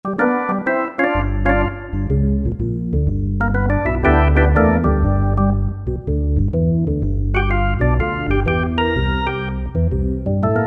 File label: Slow Rotor, Roland D-50; Factory preset demos